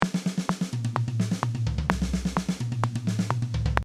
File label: Fill